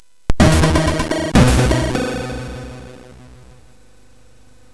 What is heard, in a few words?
Blob, MOS6581R4; hvsc